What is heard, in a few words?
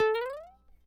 Small Mario Jump Low